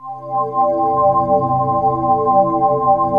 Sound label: XC RISING; Best Service Advanced Media Trax Building Blocks